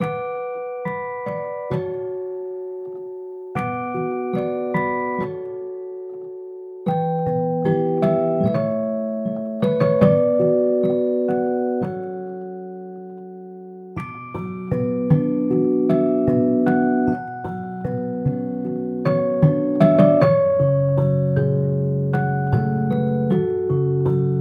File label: Unplugged; e-instruments